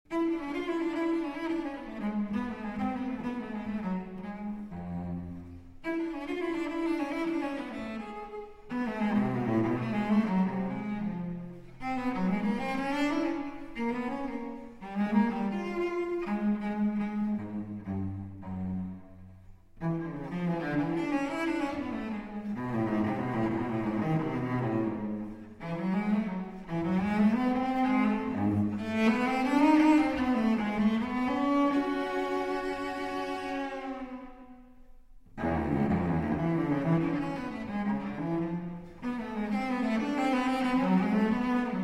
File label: Cadenza Cello